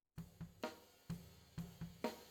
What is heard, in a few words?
Floor